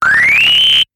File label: notify